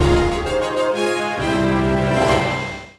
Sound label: Level Complete; Hero of Sparta; DSiWare; 3DS